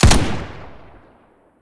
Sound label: machine gun, weapons; q3rally